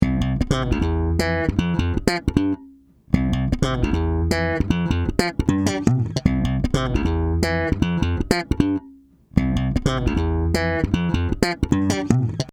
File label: Bass 160